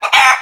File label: chicken death; ambient; creatures; Counter-Strike Global Offensive